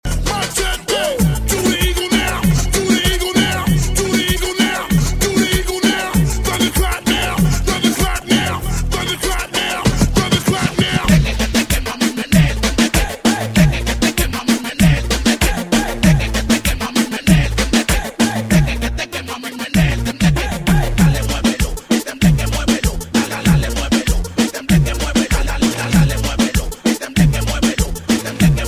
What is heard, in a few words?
Remix Reggae